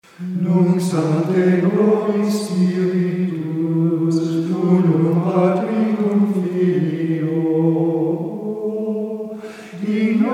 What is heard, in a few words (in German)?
Terz – Gesang, Gregorianische Gesänge